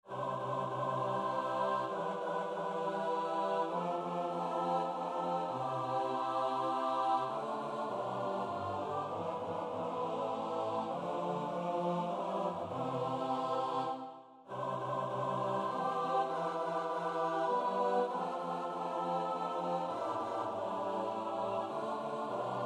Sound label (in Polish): midi dzwony dzwon